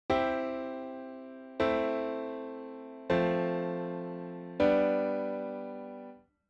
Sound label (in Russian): cepochka akkordov do lya fa sol